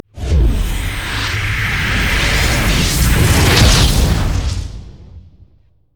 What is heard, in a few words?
summonerspell base teleport; LoL